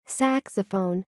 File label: saxophone